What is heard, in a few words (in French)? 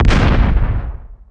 Explosion